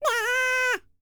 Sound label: BLACK SEA, World Voices; ILIO; Vocal Planet VOL-5